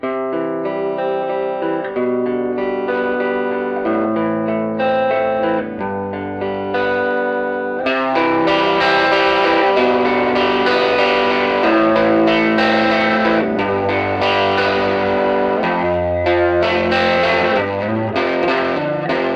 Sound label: Superior Amp Neck Pickup